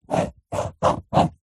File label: pen ballpoint paper hollow table draw square; office; zapsplat